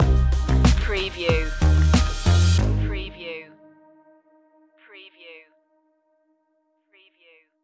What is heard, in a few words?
bluestone streets stinger